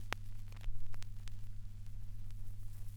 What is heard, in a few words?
crackles, Vinyl